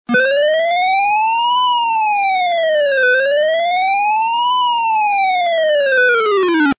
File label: alarm, ringtones